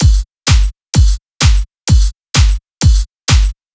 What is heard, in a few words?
drum loop 128 Strp; Anthemic